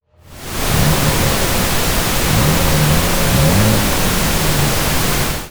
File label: Rev